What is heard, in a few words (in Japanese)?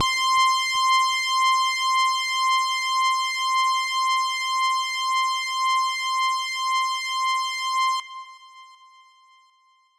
音程 女性 C5